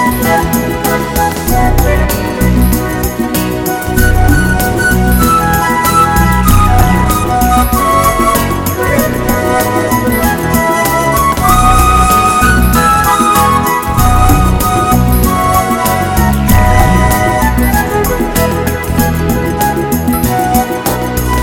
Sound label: Smooth Happy Jazz